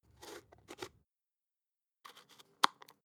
spice jar adjust

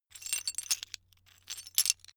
metal pieces clatter

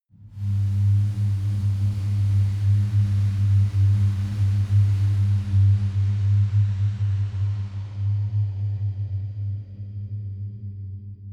atmo drone stinger, horror

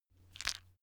stone gravel clatter soft; natur-tiere; steine

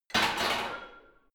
barbell heavy plates replace rack; sport freizeit; fitnessstudio

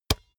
basketball dribble tap; sport freizeit

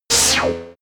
buzz signal; zaps-buzzes

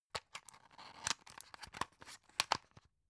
drug open soft; berufe; arzt

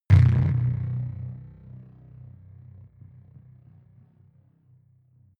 sci-fi vehicle engine stall massive; raumschiff

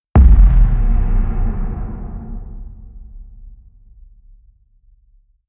bass drop; whooshes rises; hits impacts

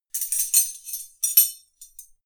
wrench jingle; industrie-wirtschaft; baugewerbe

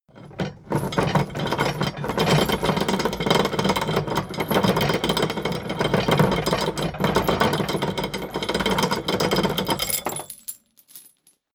drawbridge winch hoist long; maschinen; metall; soundpool

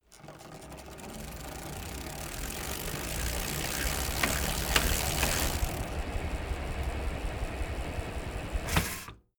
bicycle rickety accelerate roll brake soft

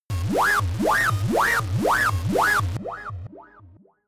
shot futuristic wobble ringing low, digital; soundpool

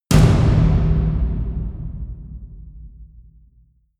huge tom hits; music elements; percussion

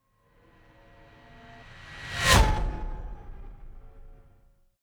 fly by hover car hum high; swooshes-swishes

